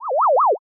flashback out

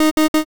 machine error

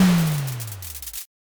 firework burst

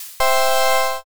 appear

